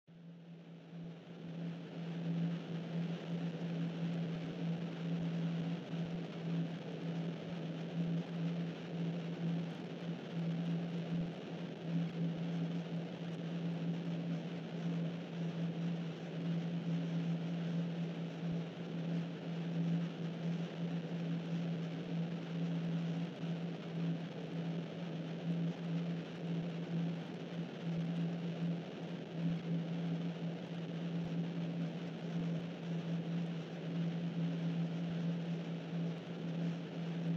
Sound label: drone; Bismuth World